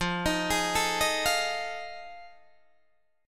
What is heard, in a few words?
F 7th Sharp 9th, Guitar, Open D